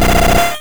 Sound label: Ice Beam; sfx; attack moves; pokemon; gen 1; rby